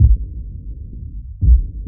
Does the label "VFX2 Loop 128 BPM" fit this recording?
Yes